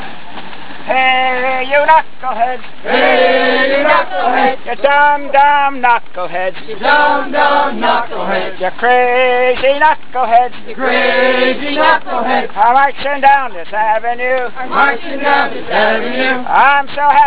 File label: Are we going strong; Nijmegen Siste Dag